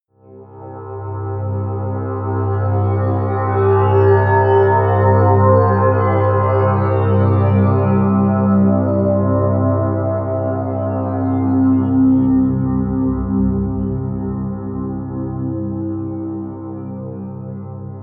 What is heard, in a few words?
drone resonator